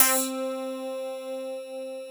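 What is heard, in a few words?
clavinet C4; CLAVNUTZ; Synth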